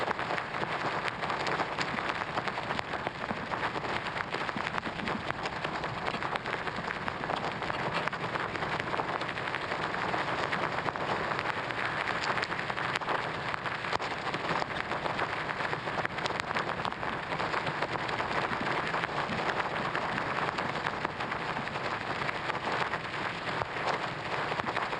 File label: EARTH SLOW; SIMUZENSOUND